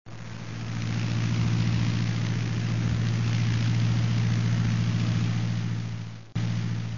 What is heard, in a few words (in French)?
machine son moteur avion 2